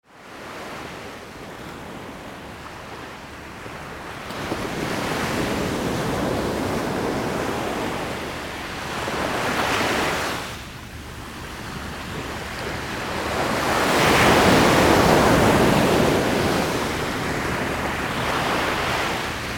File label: Japan Sea